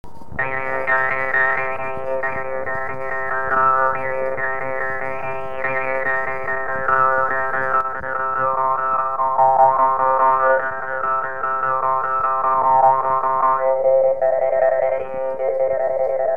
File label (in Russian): Bashkirskij kubyz